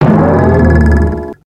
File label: Bouncers